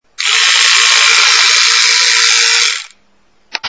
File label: Razor